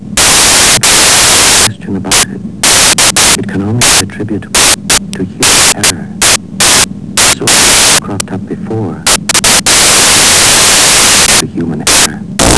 ERROR

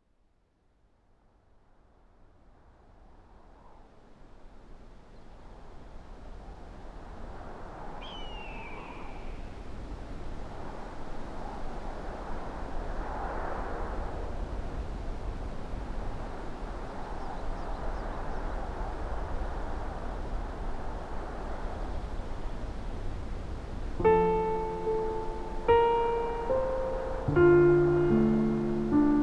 Benediction Piano; Ansel Adams

